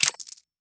radial menu buy; ui

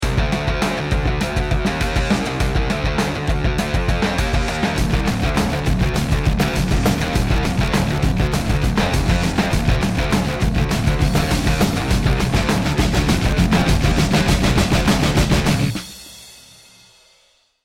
round ten sec count, music; halflife alyx

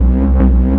beam platform loop, machines; ambient; Portal 2

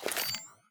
tablet draw; Counter Strike Model Pack; CS:GO; survival

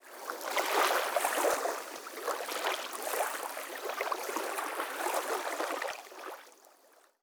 river lap; ambient; anubis

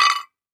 pottery impact, glass; physics

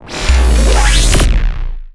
charger flashbang windup; weapons; Half Life Alyx; combine flashbang

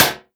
flavor metal, bullet impacts; Half Life Model Pack

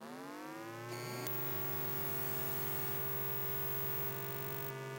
tech oneshot, office

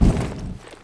metal vent, footsteps; player

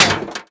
bullet metal grate; physics

